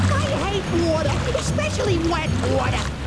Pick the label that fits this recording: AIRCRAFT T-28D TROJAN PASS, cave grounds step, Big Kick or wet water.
wet water